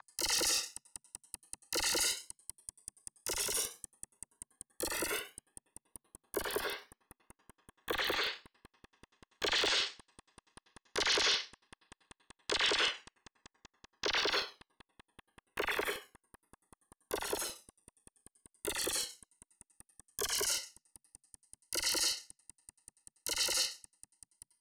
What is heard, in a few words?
moving layclap noise; Crowdsourced Sample Pack; TRUTH-HZ; Drum Kit; Percussion